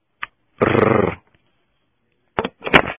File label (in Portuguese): caixa postal